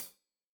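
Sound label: hat closed, hi-hat cymbals stereo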